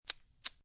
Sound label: Suppressed Pistol Amphibian Load; Pistols; Guns; VRSonic